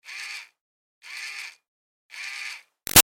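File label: Toy Fairy Wand Medium Far Revs Short; Miscellaneous Toy; Sound Effects:Toys; Big Room Sound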